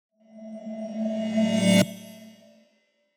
Tonal Whoosh; 光效

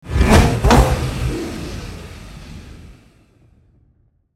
Backfires; 空踩油门

